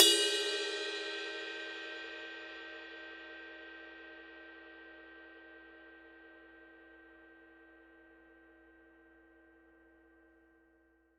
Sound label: ride bell